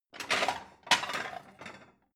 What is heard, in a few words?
plate multiple take